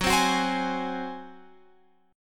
Gb Suspended 2nd Flat 5th Gb, down, Guitar, Open F; strummed